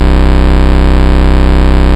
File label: Ultimate Bass Root C; Vengeance Samples